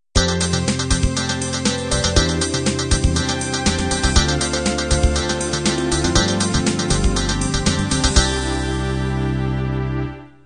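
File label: standard 16 beat end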